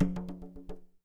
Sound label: LIZARD H, AFRICAN PERC; AKAI S6000 CD-ROM - WorldSounds